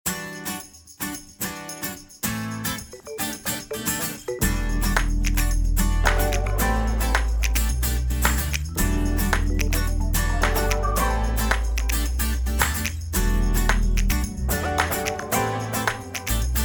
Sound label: The Limit Playback